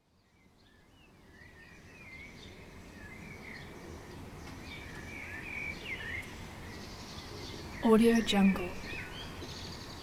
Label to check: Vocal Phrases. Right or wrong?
Wrong